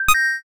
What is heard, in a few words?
buy